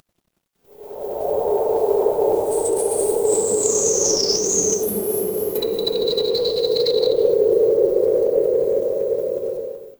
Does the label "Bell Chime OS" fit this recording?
No